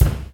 solid snare sample c sharp key, steel snare drum

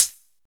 pedal hi hat sound B key

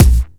natural bass drum b key; tuned drums

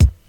raw kickdrum F key; kick drum samples

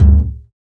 short reverb bass drum single shot d key; kick

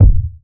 subby bass drum single hit g key

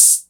juicy open hi hat c sharp key

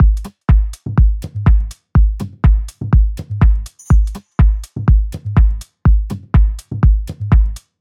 house techno beat passage 123bpm; drum loops

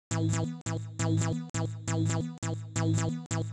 sharp synth techno sequence; synth loops

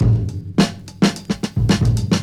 71 bpm hq drum groove a sharp key; drum loops

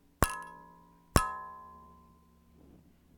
small hit in the oven thermometer

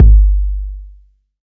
roomy kick one shot G key

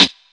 dry snare sound c sharp key; Duration 0:01; Mono; snare drum samples

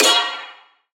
trap steel snare drum sound g sharp key

